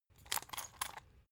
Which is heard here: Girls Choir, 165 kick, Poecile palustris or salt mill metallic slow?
salt mill metallic slow